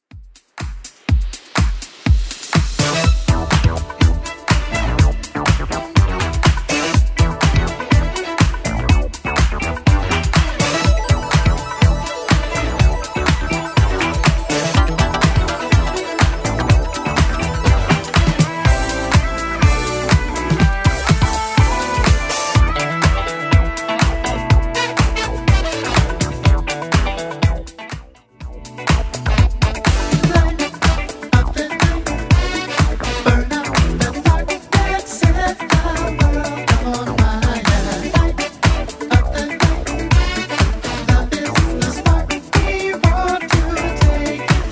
Light Up The Night 80s Club 123 bpm

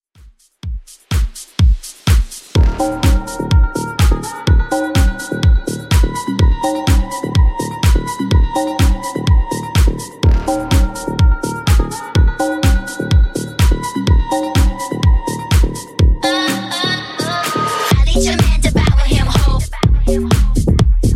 House Redrum 125 bpm

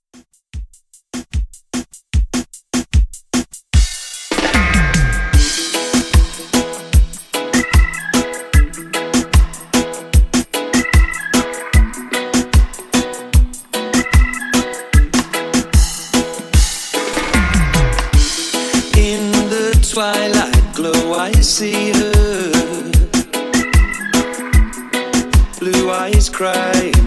Reggae Reton 150Bpm

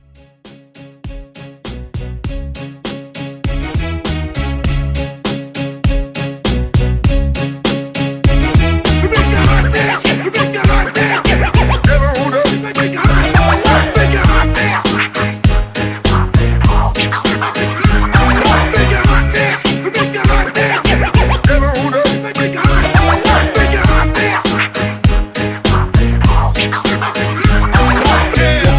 Mashup; remixplanet

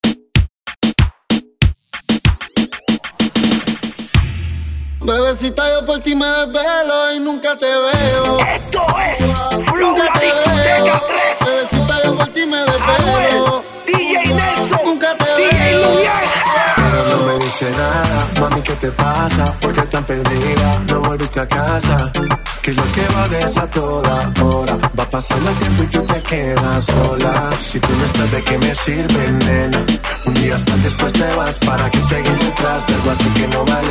Reggaeton 95Bpm

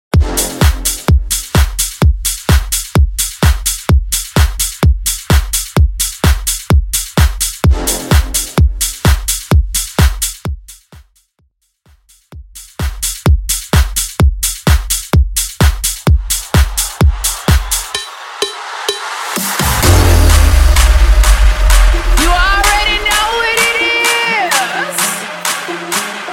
EDM 128 Bpm; Mainstream VIP; Bootleg; Vegas Edit

